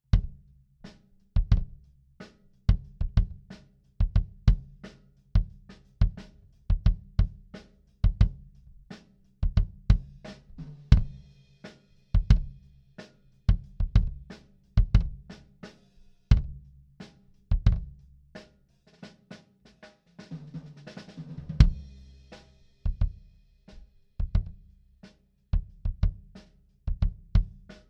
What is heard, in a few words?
bassdrum